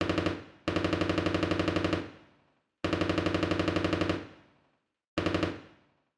gunshots machine gun burst distant; sound effects one

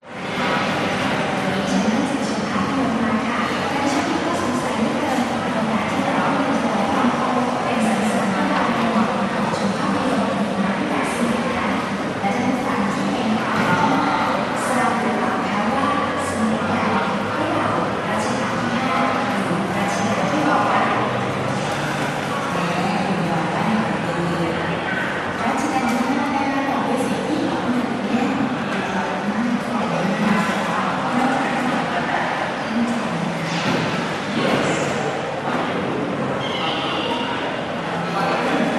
Thailand Post Office; Audio Hero

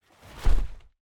MONSTER Yeti snow footstep single

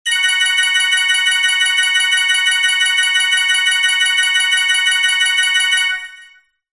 multimedia game star win gain many; zapsplat

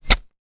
industrial shovel single dig into soil; zapsplat

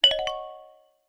alert bell ping wooden

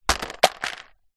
vehicle car hubcap plastic land in road

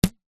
foley elastic band fire flick shoot

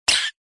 human fart short airy forced silent; zapsplat

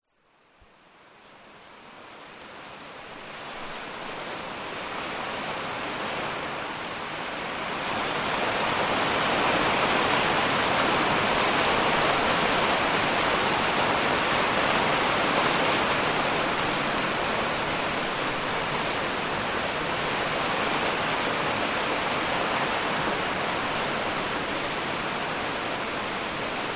Single Sound Vietnam Hoi An Strong Soft Rain

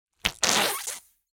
cartoon squeeze squelch

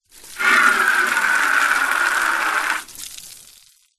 tin small empty no lid water spray into from hose; zapsplat

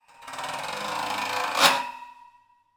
foley metal scrape grill small

